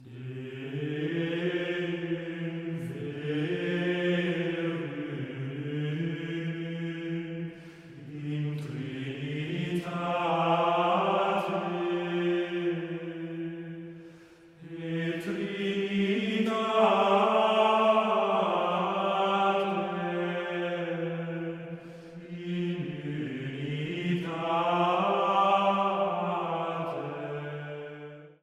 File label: Classic Plainsong, choir; The "classic" sound of plainsong, as promoted in the 20th and 21st centuries is known to us all